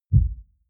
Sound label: sub bass